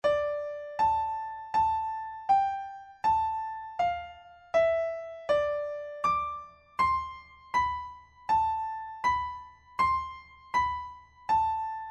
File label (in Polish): hejnał sopran midi